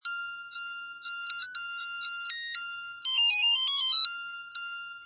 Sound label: robot bar intro tune; Shikon X Astro Defense Fortress